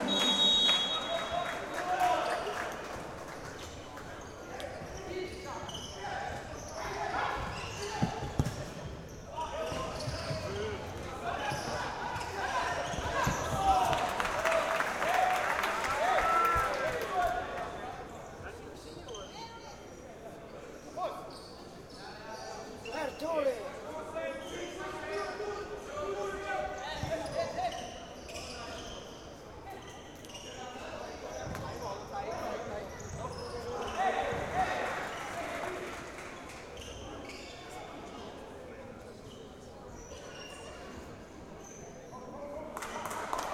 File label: Masculine League Match, Futsal Match Ambience